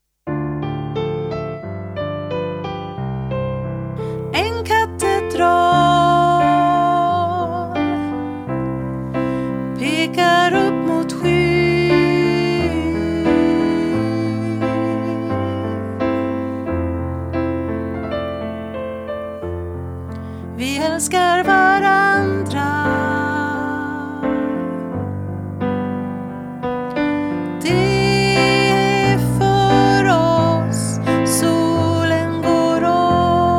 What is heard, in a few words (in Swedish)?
sopran